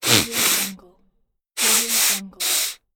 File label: the snuffle nose